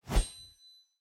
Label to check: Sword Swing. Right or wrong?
Right